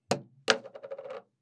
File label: drop, small plastic compact deli cup